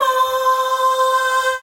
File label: boy, Voice; Notes